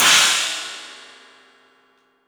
CYMBALS; 3D Sound; 3D Drums

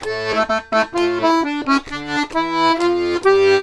Accordions; USB Soundscan vol.40; AKAI

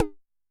TEKK 2M, Tom, Yamaha DTXpress-II

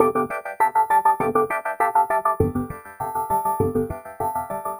synth 100 gentle G Maj; Transmission-X

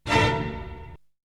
Hit; ZERO G - Datafile DISC 1

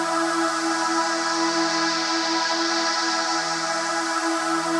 Pad Loop E, shimmer and sparkle

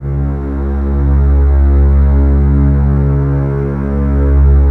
Basses C#2, String Ensembles; Miroslav Vitous; LINKS ORCH

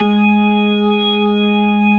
DIRTY G#3, DIRTY ORGAN

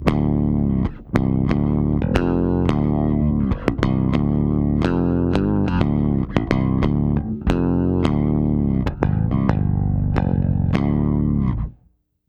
SHOOT UP, Bass, 90 BPM; Sampleheads; Ultra Freakin' Bass

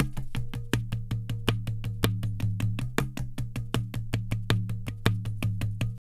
Pure Tabla, 080BPM; Zero-G

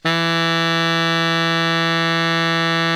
baritone FF E 2, Sax; Giga Samples Collection

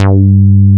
BASS G3 F; MR.J BASS

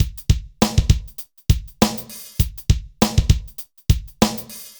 dry Loop, BPM 100

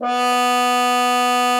CUP MUTE; Xsample VOL-3

